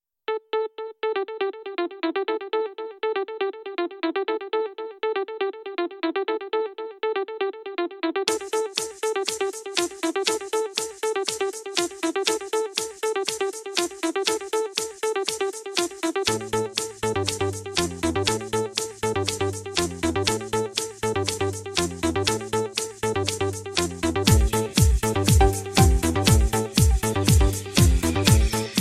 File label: Beat